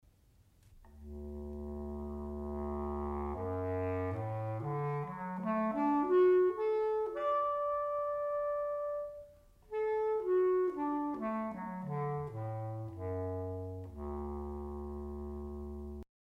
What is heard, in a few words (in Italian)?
clarinetto basso suono; scuola di musica; strumenti